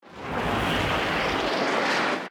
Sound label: damage hits explosions, Warships